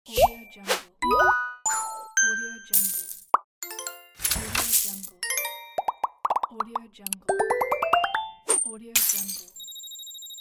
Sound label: infographic sounds pack